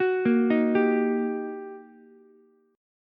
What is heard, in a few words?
G Minor Major 7th Sharp 5th, down, Ukulele; GmM7#5 arpeggiated; JGuitar